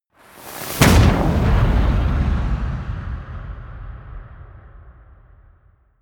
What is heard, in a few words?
missile small fire stationary close